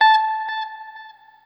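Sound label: NOODLE SHORT, Guitar & Bass; Quantum Leap; 90's Sample CDs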